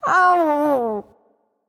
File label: growls